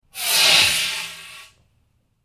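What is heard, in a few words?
elephant, elephant trumpet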